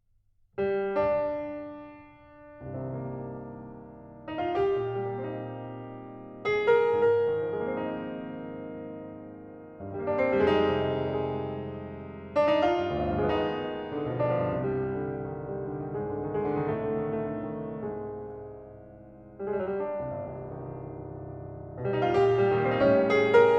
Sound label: SAKURA Waltz